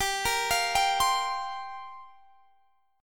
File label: G Minor 7th Add 11th; Gm7add11; arpeggiated